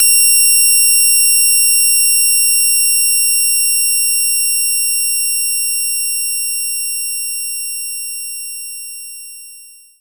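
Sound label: Guitar Harmonics C6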